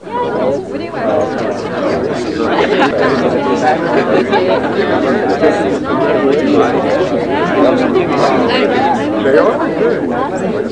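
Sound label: background music; Virtual Graceland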